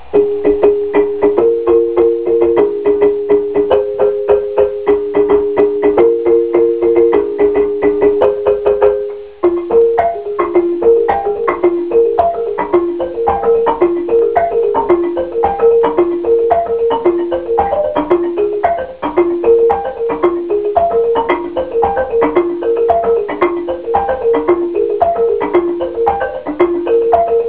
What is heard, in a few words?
balafon